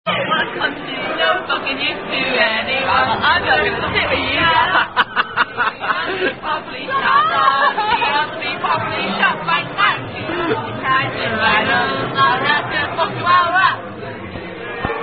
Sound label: Singing Rugby Songs; Born So Beautiful (Tune Ellacombe) And Unknown Down Down